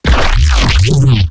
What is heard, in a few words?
Bass Shot; neuro; BIG5AUDIO